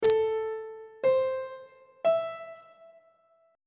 e sharp major chord 3 is g double sharp minor on treble clef